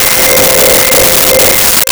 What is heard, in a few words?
Echo Drops C5; Nintendo; July 2020 Gigaleak